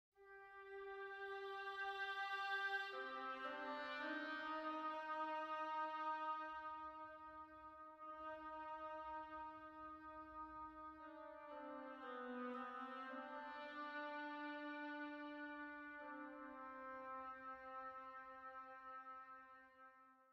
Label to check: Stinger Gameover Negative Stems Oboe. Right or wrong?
Right